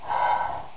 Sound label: Air, Train; OpenBVE